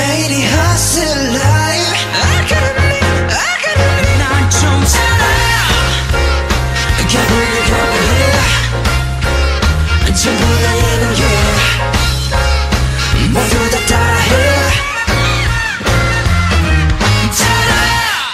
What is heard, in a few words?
Dope Jazz Version